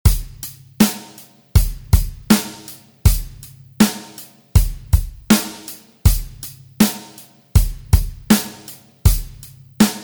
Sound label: 80 bpm drums